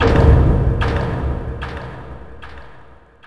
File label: echo click